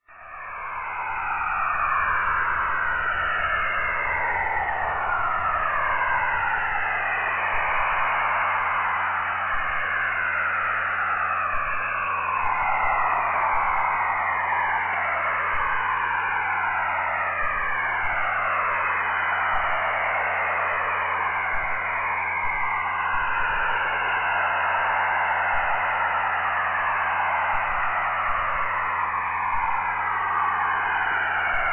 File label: strange noise at 7100khz; gg40jt